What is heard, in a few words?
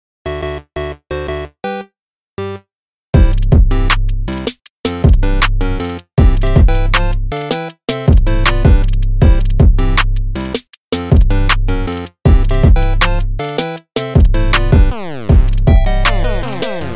Trap Remix; suonerie